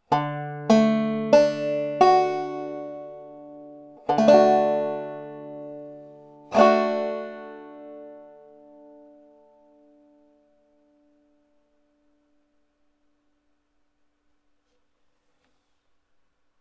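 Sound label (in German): der d dur akkord, banjo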